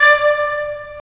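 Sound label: WND CL, wind clarinet; LA Composer vol. 3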